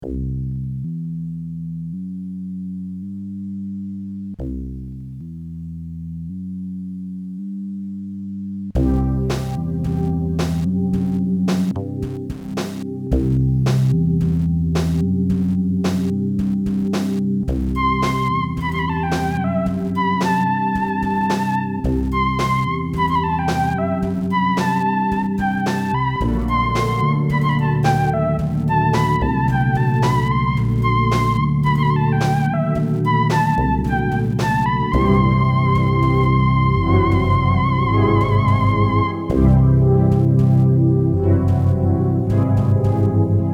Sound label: Chiptune